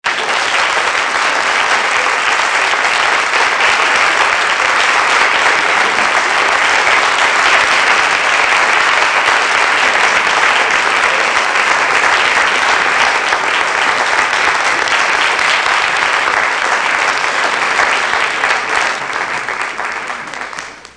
Applaudissements; Applaudissements 3

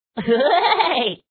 rire

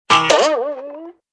erreur; MOBICLIC 83; CPLUSCLAIR

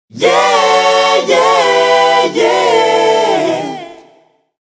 Choeur; MOBICLIC 83; CPLUSCLAIR

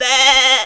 agneau; MOBICLIC

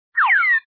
boîte tombe

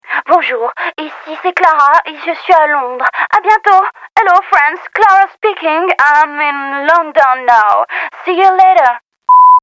Bruit

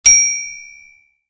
clochette

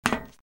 pas metal

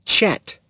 CHAT

